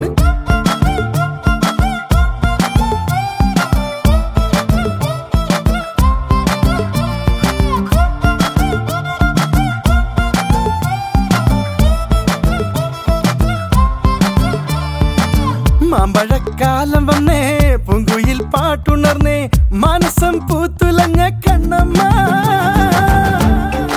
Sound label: violin music